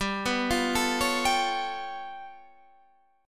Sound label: G 7th Sharp 11th G; arpeggiated